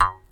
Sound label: jaw harp recorded live oneshot, in a small apartment studio